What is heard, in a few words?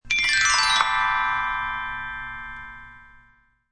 Xylophone Descend, Descending notes played on a xylophone; Mallets; Musical